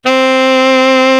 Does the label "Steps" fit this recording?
No